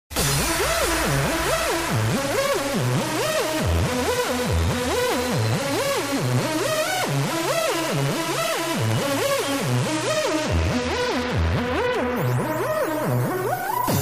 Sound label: beat